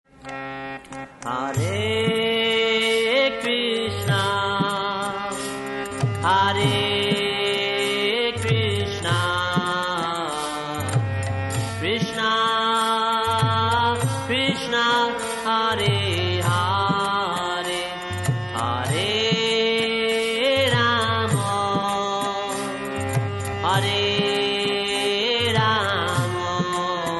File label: Melodious Maha Mantra